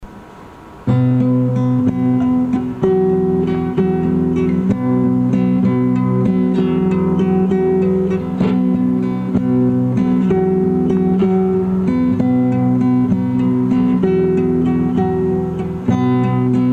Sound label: Guitar